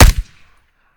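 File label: akilo47 suppressor player, weapons; viper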